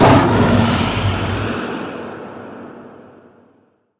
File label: npc dragon injured